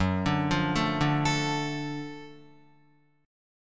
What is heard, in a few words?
Gb Minor Major 7th Sharp 5th Gb down Guitar; arpeggiated; JGuitar